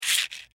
Servo Mechanical SciFi Robot Toy, Scifi Servo C Mechanical Robot Small Motor Toy; BBFX